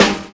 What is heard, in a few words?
FILL; Univers Sons - Kitch 70s